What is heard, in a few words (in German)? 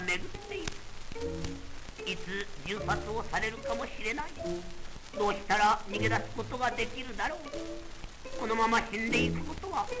schleifen